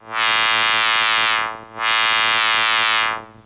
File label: Monotron Sci-Fi